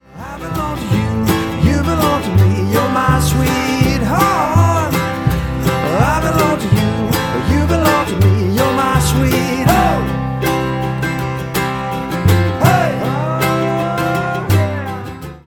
acoustic band

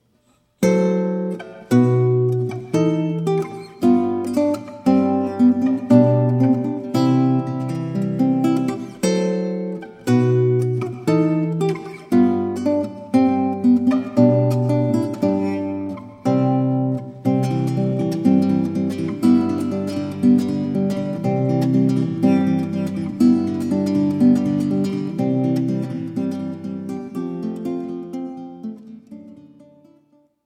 Classical Guitar; Wedding March

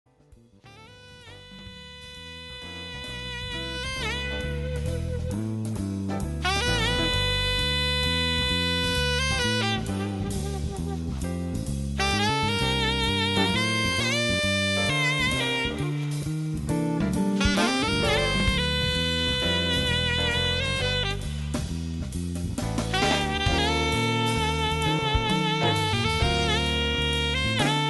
Solo sax

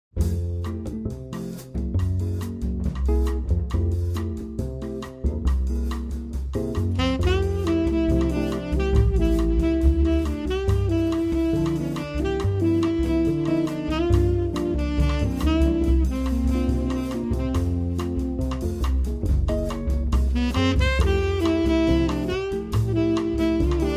quartet, Swing

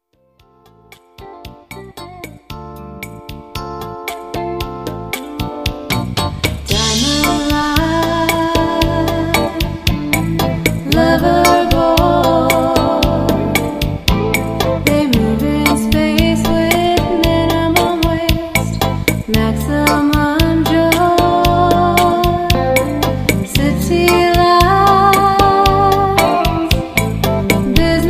female vocals, jazz band

